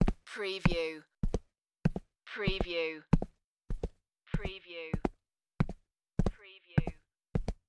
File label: Footstep Combat Boot